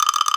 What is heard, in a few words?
Klocker Roll, drum machines